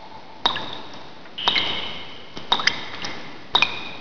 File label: drips; KATANA; Daikatana